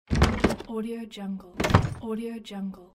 old castle wood door open and close

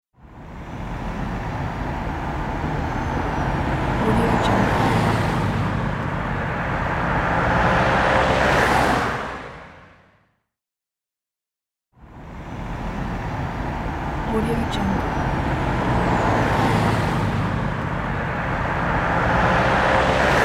car passing by

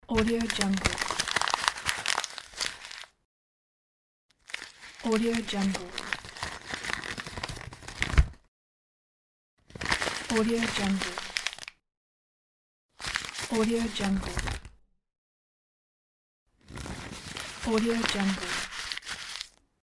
paper crumple

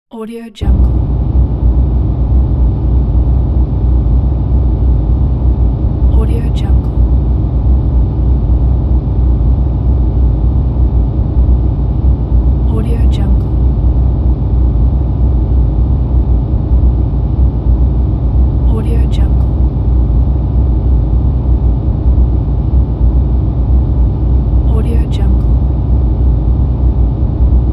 rumble space broadband

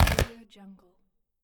big tear

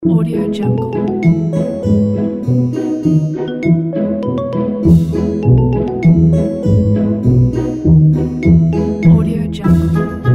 funny family playday

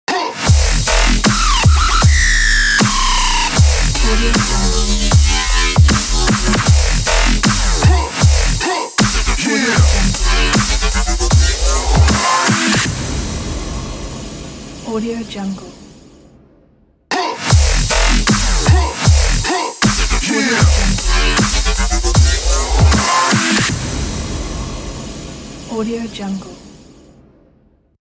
aggressive dubstep logo

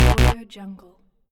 wrong answer buzz

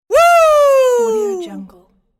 male voice woo

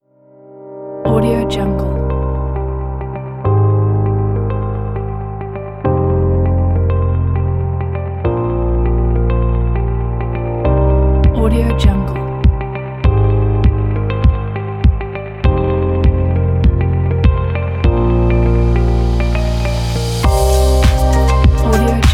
corporate

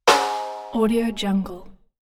cartoon ringing hit

